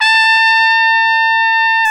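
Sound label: RCA trumpet, jazz; Solo Brass; Trumpet 5-7; Tp 5 RCA Jaz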